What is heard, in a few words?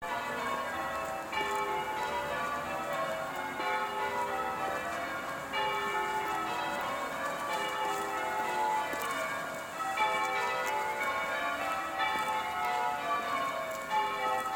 church bells, Warwick; St Mary's